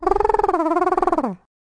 casino nessie; burns casino

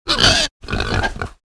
pig grunt tap; ea tsto

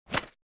pickups; corporate; secretagents

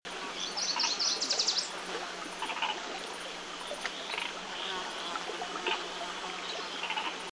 foggy swamp loop

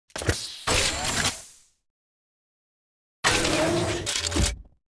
automatied tracking machine; secretagents

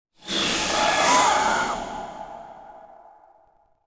spooky brambles

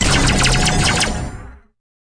satellite station tap; superheroes2

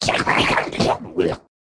pet mutant plant tap; ea tsto

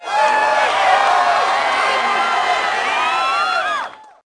tap; tailgate

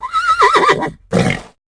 horse tap

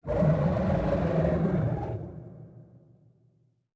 ambience; superheroes2; pinchy

